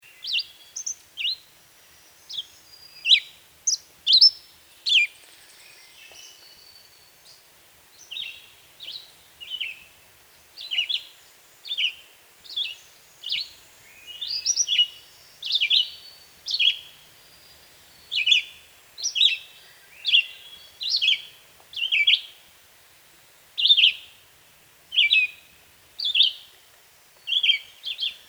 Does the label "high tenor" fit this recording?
No